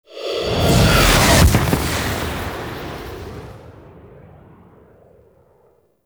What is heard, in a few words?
Fly By Quick, Vehicles; Warframe; Liset; Liset Flight; Zariman Liset